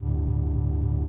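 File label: shuttle hover loop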